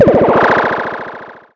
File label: Prize Pick; MexicanaChillitime; ClubFusion